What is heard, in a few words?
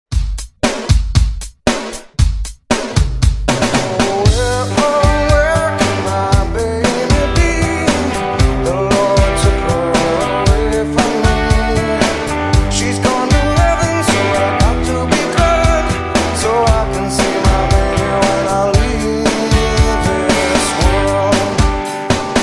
Re Drum 116bpm